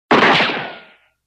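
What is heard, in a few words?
Pistol Interior Shot Ricochet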